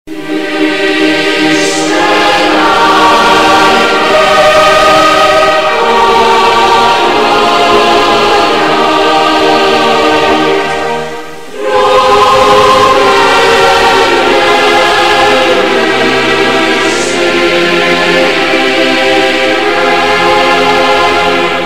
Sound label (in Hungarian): Magyar Himnusz